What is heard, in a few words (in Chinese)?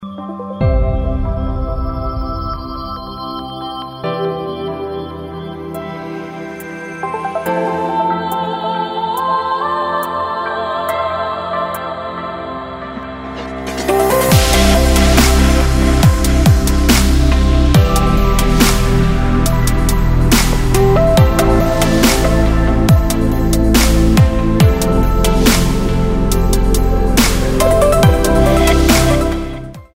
专题片背景音乐